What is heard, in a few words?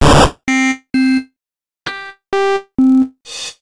instrument test music; Nitrods